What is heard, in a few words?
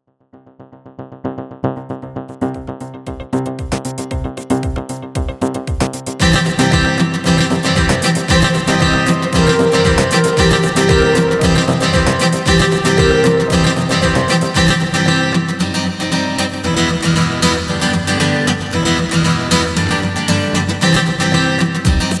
Guitar Song